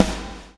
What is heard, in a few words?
BLOW; Spectrasonics - Backbeat